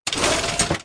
keypad Short; eggomatic

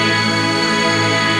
nearwin; vegas night life

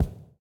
reel Bounce, slots; king of slots

